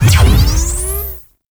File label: Door Trip Laser Start; Warframe